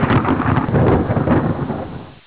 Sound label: thunder